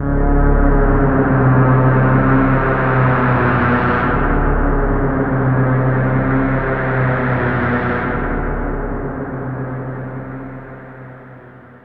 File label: Attack of Mutant Flies; Polyend; Plughugger